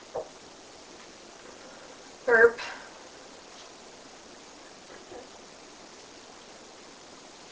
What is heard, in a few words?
Laugh